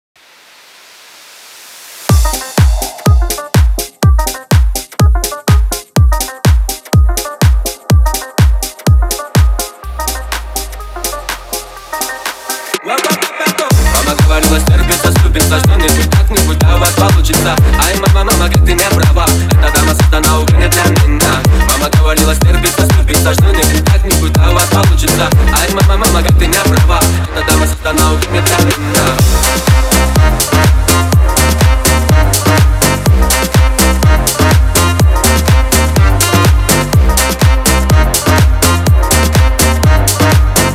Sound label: remix